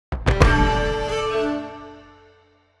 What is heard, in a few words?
Pirate Sunk Victory; Blackwake